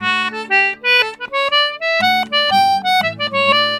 Celtic Flavours; USB Soundscan vol.42; AKAI

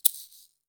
SHAKER, Heart of Africa; Spectrasonics; Heart of Africa 1

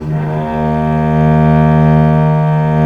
VL D#1, VIOLE, Historical Instruments; USB Soundscan vol.23

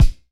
Kick, Jomox Kicks; Art Of The Hype; Airwindows; Dimensional Jomox Kicks

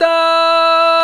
VOX, Male Scats

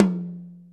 FULL RM T, HIP HOP; Pro-Rec - Synthsonic

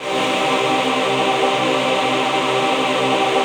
VOICEPAD; Best Service; XX Large Pads; Sample CDs

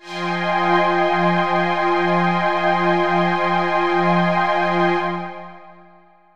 pad timeslip F

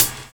HH, drums dance & groove; USB Soundscan vol.11; AKAI

